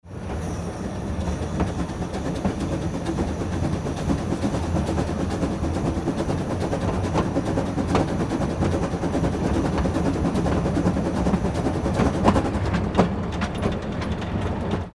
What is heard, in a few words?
old train cabin inside